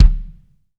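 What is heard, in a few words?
bass drum, Drums Acoustic; AKAI; 90s sample CD; USB Soundscan vol.10; RAW KIT 7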